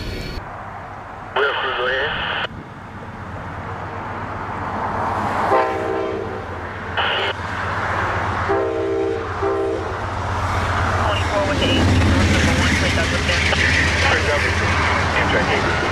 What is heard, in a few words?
radio